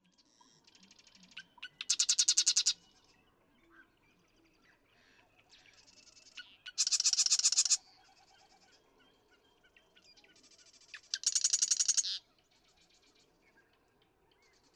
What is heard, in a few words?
Marsh Wren, birdsong